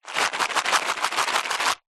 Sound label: food sunflower seeds pack shake